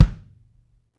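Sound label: kick, Roland; Club-50 - Foundations Roland; Ext.Snare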